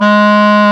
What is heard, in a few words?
WND HARD, Clarinets, Clar Bryt; Roland LCDP04 Orchestral Winds; 90 s Sample CDs